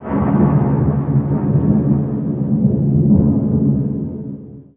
Thunder; ImpGal2; groundwar

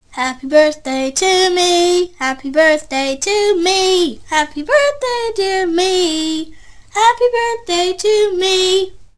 sing

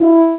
horn

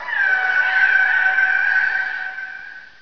WHALE

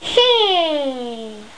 Hmmm; Let's Discover Michigan!

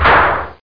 unjam

